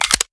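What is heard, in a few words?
pistol reload fast mags mag in; gmod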